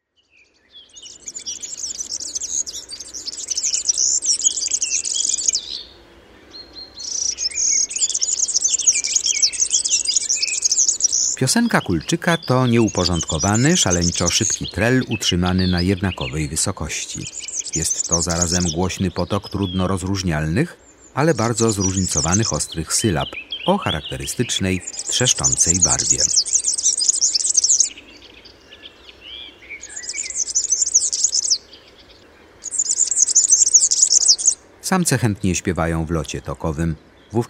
Kulczyk, Ptaki; Kurs PTTK

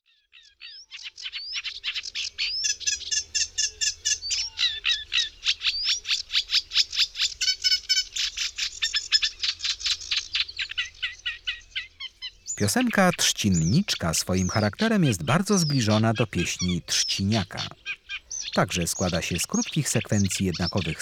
Trzcinniczek, Ptaki; Kurs PTTK

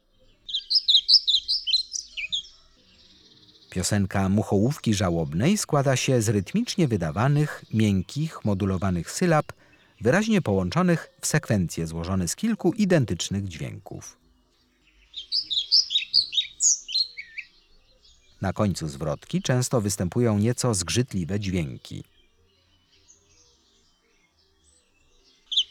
Mucholowka zalobna, Ptaki; Kurs PTTK

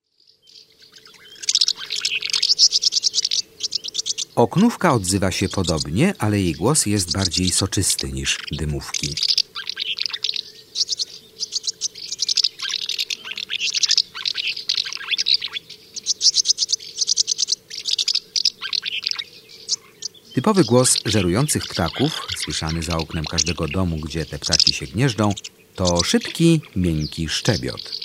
Oknówka, Ptaki; Kurs PTTK

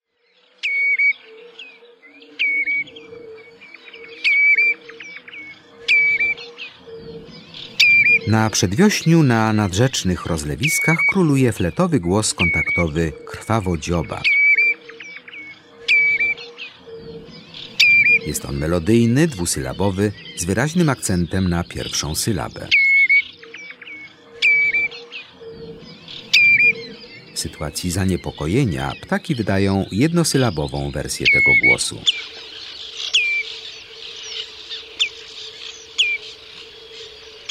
Krwawodziób, Ptaki; Kurs PTTK

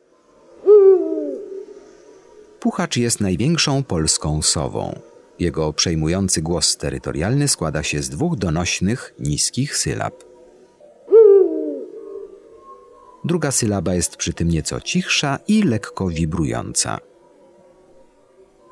PUCHACZ, Ptaki; Kurs PTTK

